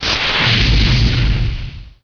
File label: flare fire